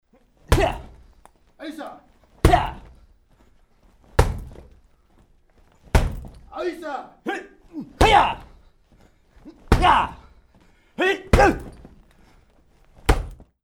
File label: karate kick punching bag